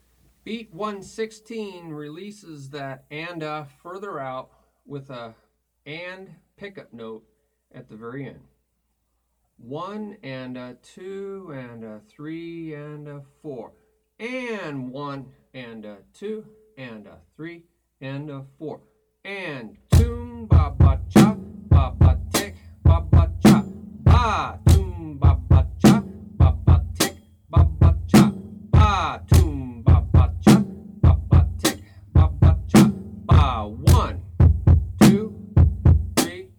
Beat 1 a2 a3 a4 Toom BaBaCha BaBaTi BaBaCha Ba

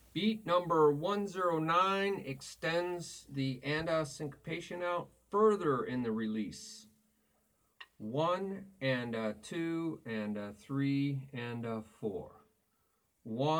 Beat 1 a2 a3 a4 Toom BaBaCha BaBaTi BaBaCha

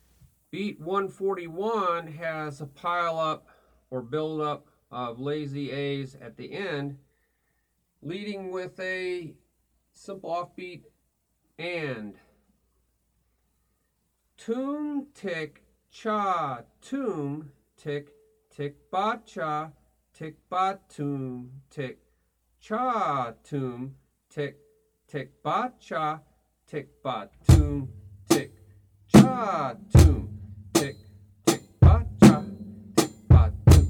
Beat 1 2 3 a4 a Toom Ti Cha Toom Ti TiBaCha TiBa

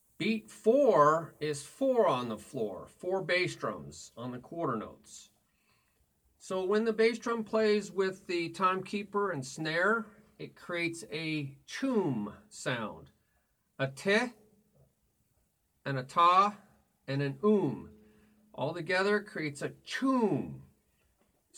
Beat 1 2 3 4 Toom Choom Toom Choom

